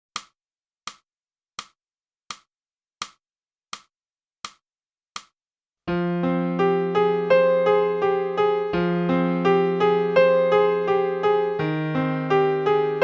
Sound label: sax